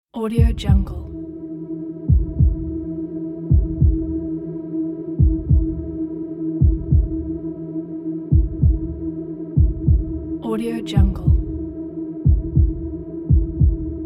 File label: heartbeat ambient accelerated